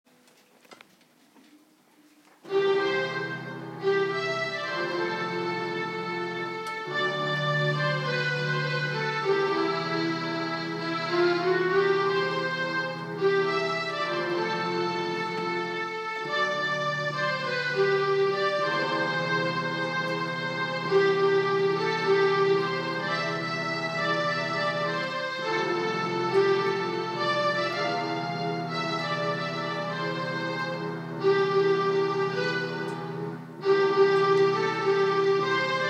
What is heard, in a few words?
keyboard solo